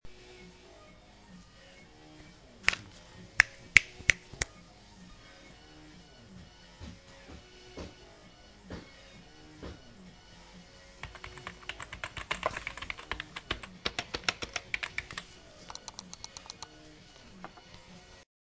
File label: office